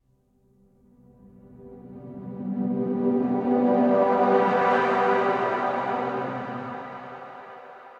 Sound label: Golden Gate Texture; Laboratory; Drone